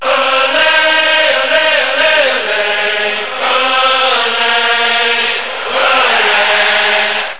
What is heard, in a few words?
chant; tfc